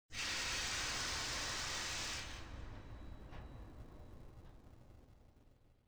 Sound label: steam; Soldier Of Fortune II Double Helix; XBOX; kamchatka